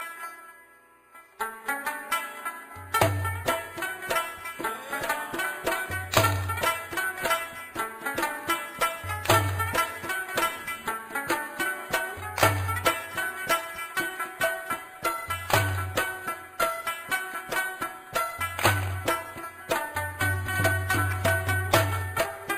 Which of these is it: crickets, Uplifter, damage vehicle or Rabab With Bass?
Rabab With Bass